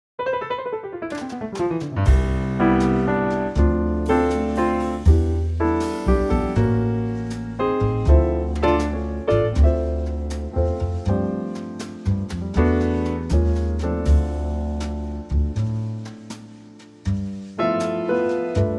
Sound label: C Trio